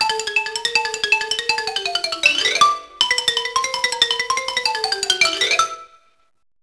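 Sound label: xylophone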